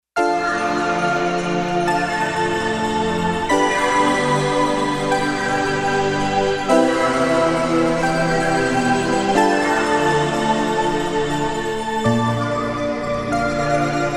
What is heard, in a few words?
Myst Pad Stck, synth